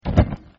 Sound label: Door; Hero in the Ocean